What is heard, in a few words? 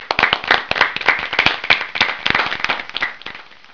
Hand Clapping; Word Breaker 1.0.1